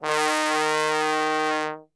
D#2 BASS TRB, Brass; Best Service; Brass Super Section

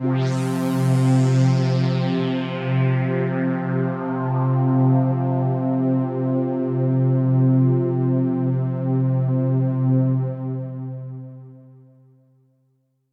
Pad C, Synth Prophet One Shots; Electronisounds

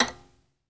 SOLO VI, Synclavier Strings; ILIO

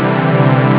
chord; ST-XX Amiga Soundtracker Sample Packs

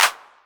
Clap, Novation Drumstation; DrumstationV1

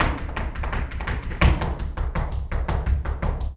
Flamenco, ZAPATEADOSOL

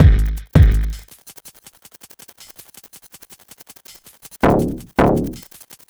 Kick Hat, Drums & Percussion, Electronic; Sonic Foundry (Sony Creative Software) - Electronic Point Blank

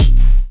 ASR-X Kick, Ensoniq

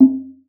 Conga, Session Instruments

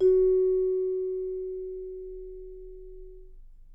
F#4, Percussion & Harp; Miroslav Vitous

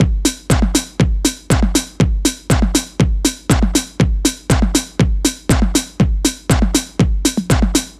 4 floor beat, trance

